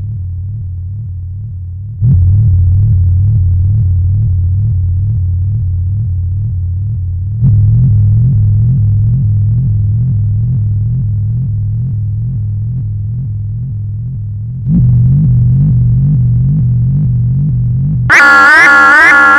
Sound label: Pro One